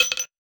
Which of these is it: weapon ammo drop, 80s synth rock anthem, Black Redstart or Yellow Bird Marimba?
weapon ammo drop